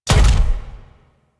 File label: Mech Step; Warframe; Vehicles; NechroMech